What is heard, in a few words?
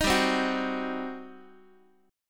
G Major 7th Suspended 4th Sharp 5th, G, down, Guitar; GM7sus4#5 strummed; x,x,6,5,4,5